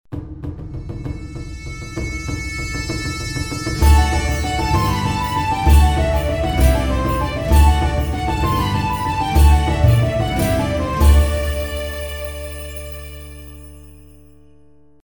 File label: Tavern Music